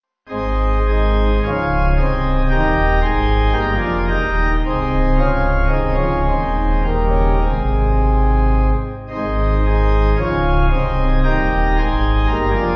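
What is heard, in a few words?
Pipe, Pipe Organ backing; All Hail The Power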